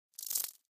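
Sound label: Insect Clicks Warning Threatening - Rattle Snake Type Rattles - Stag Beetle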